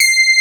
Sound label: SB HORN; Wizoo - Powered Nord; STEREO LAYER